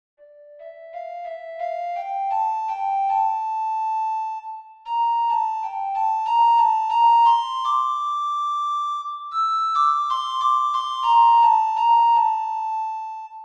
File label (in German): Wellenmusik Melodie